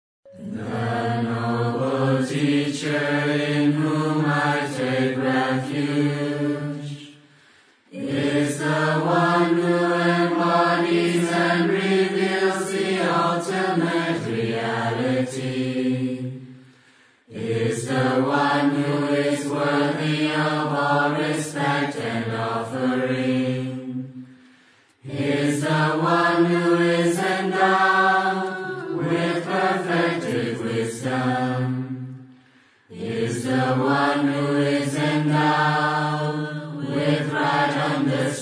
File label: praising the buddha